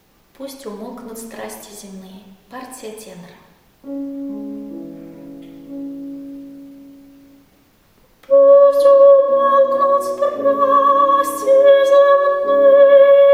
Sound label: tenor; Pust umolknut strasti zemnye